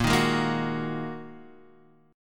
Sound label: A sharp 7th Suspended 4th Sharp 5th down